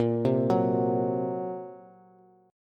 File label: Bb Suspended 2nd Sharp 5th, Bass; Bbsus2#5; arpeggiated; x,13,10,11; JGuitar